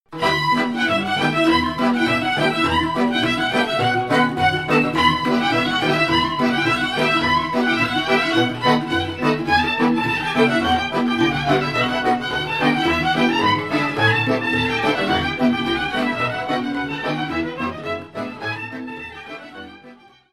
Roumanie

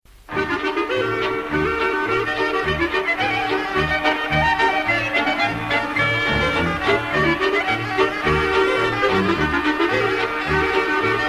Polka - Republique Tcheque; Domazlicka Polka

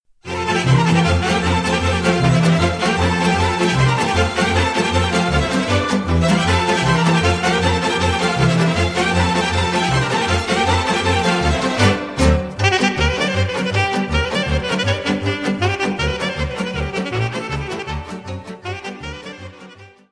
Ardeleana, Roumanie

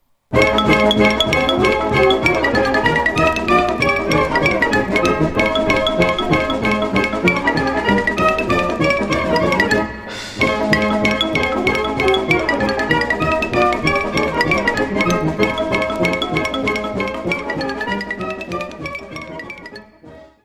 Sirba - Roumanie

